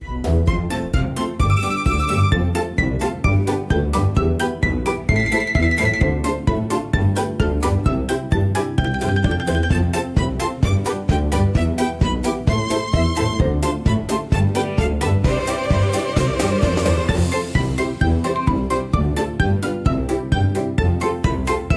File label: rainbow whirl